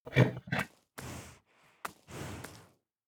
startled; Rust

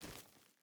footstep burlap gravel walk; Rust

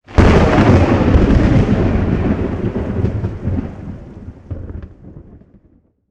ambient battle; World Of Warships

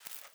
ui pickup seeds; Rust

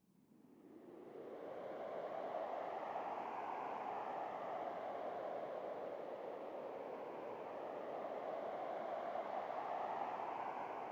sting wind gust; Rust

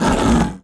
panther hurt vox; Civ4 warlords

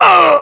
death, english

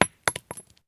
grenade collision concrete; Escape from Tarkov

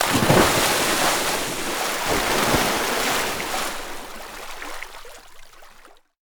combat atmosphere; World of Tanks

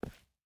footstep boots concrete walk; Rust

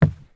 sprint wood; Escape from Tarkov

